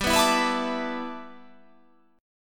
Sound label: G 6th Add 9th